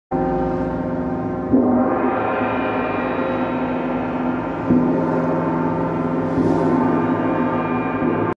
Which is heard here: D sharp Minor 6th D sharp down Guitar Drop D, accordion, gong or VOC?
gong